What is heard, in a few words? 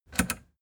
plastic button latch click enable fast